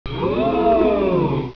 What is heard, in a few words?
Crowd Oooo